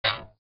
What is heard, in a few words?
boink